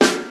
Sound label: original snare single hit f sharp key